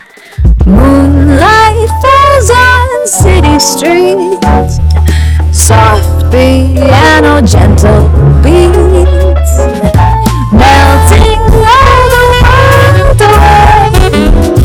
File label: jazz amplified